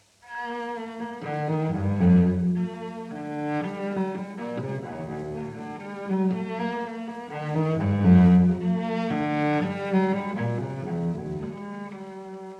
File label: Suites for Solo Cello NO.1 in G Major VI. Menuet II Live; Bach Suites for Solo Cello, Bvw 1007-1012 (Live)